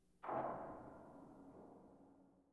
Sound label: metal creaks